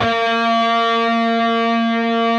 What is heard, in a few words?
PRS lead; Spectrasonics; Hans Zimmer Guitars VOL-1